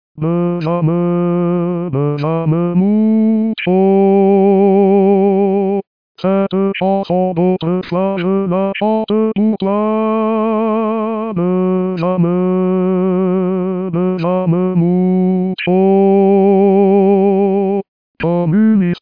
Baryton

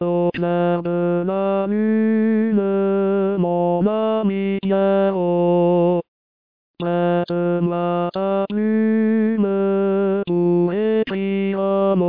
Clair Lune Tenor; Au Clair de la Lune - Tenor

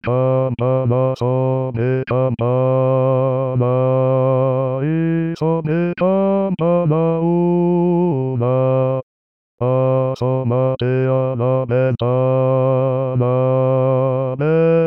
Basse; 2 voix; Bélen Campanas de Bélen

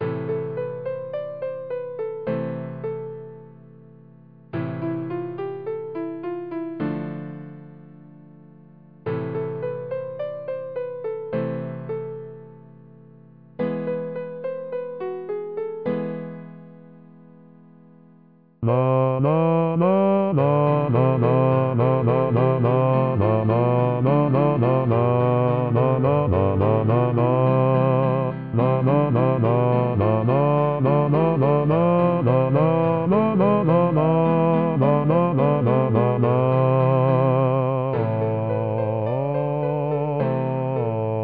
Temps Fleurs Basse

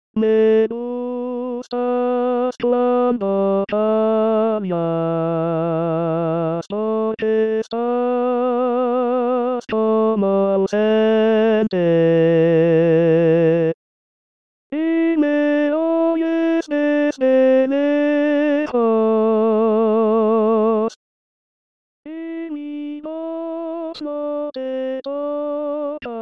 Tenor, Voix; Neruda

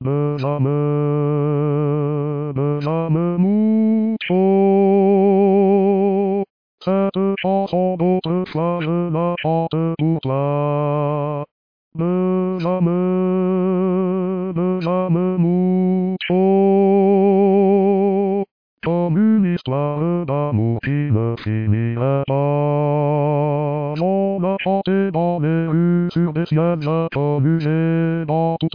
Basse

